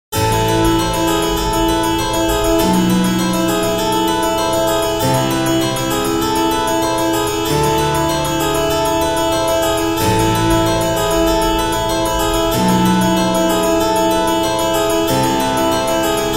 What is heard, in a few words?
cromatic minor